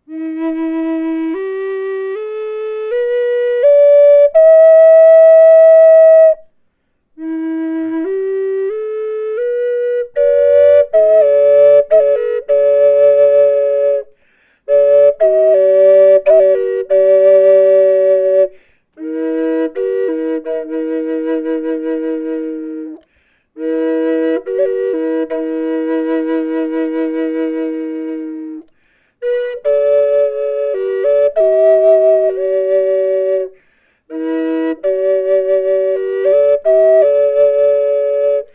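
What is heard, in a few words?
natural, flute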